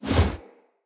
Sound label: Split Sword Whoosh Heavy; Weapons; Player; Swords; Starter